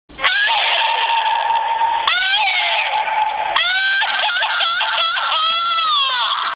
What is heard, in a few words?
jeep horn laugh